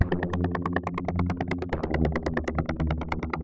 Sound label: Synth Poly